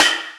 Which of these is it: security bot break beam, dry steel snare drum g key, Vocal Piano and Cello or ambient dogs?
dry steel snare drum g key